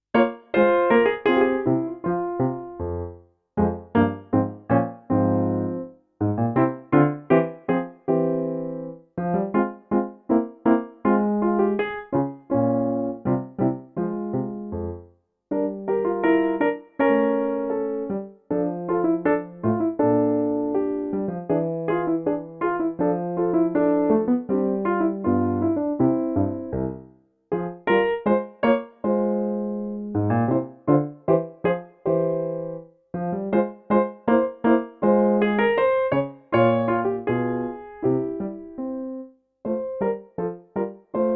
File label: Rondo in F minor piano